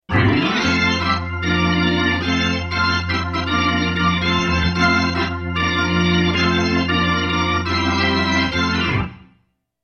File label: Leslie II